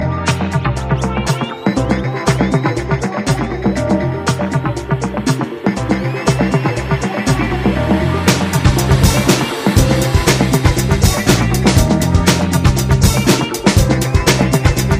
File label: Hepa Filter